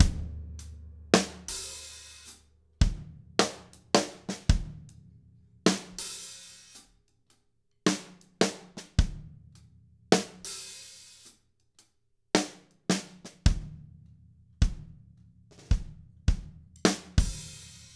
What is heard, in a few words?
107 SLOW GRV, Drumscores; Steve Gadd Drumscores; LO BPM